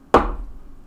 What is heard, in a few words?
Slam Drink On Table